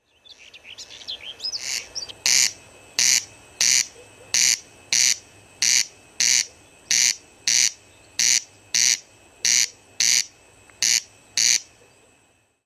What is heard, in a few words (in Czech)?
hlas zvuky zvirat